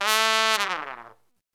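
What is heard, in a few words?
trumpet long fall; OMI - Universe of Sounds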